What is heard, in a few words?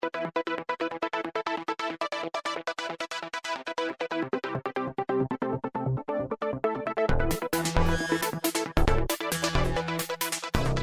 access virus